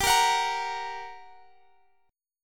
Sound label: G Minor Major 7th, down